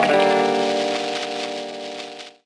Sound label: RAW DIRT; Rawcutz; Nu Forms